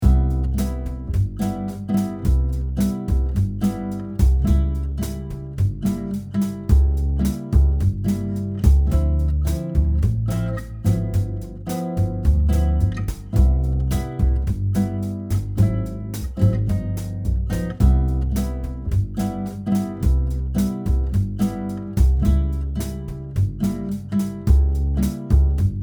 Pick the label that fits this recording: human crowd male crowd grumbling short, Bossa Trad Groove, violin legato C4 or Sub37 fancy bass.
Bossa Trad Groove